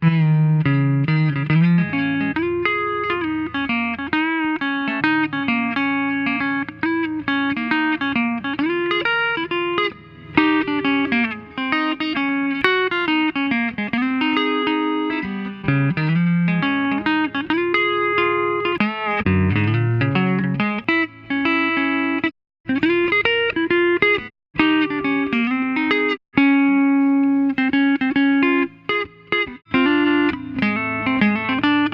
solo guitar for delay

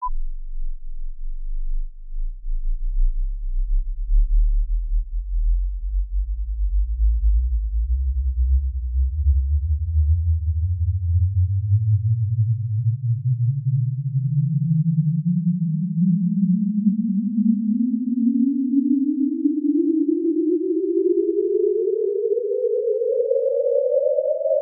1/3 oct pink noise sweep 20 Hz - 20 kHz

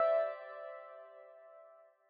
112 C Synth Riff Tail; New Age Ambient Loops; Tail Samples; Spring

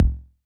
808 BD short, Roland-808 Drum Hits Bass Drum

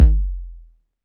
808 BD long, Roland-808 Drum Hits Bass Drum

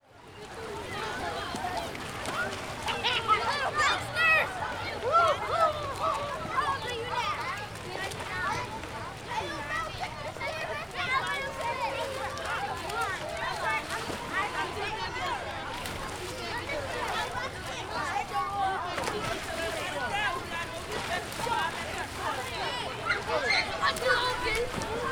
playground, ambience

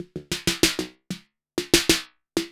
808 Loop SD 95, Roland 808 Drum Loops, 95bpm

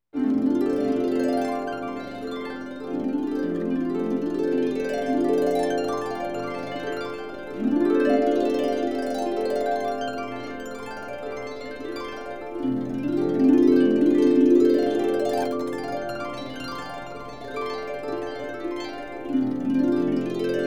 harp glissando, orchestra effects

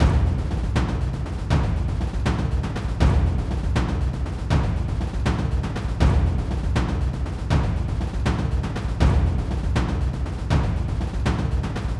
Drums cinematic action Strikes 160bpm

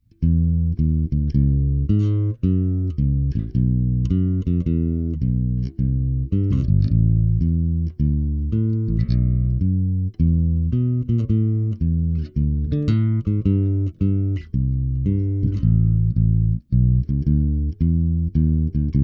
Bass

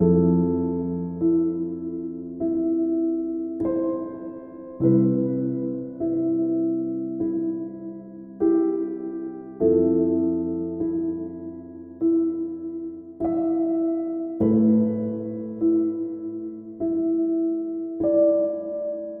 cinematic drama hanging Piano Chords AM 100bpm

